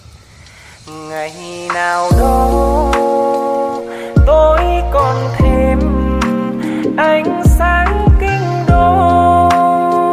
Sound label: Lofi